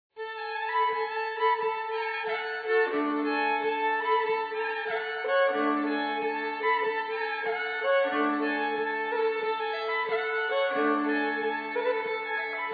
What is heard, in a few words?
Halling Fiddle; Rotnams-Knut; grieg